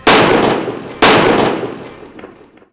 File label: Shot Gun